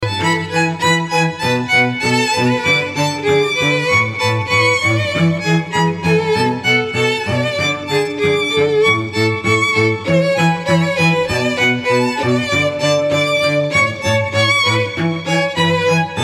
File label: String Trio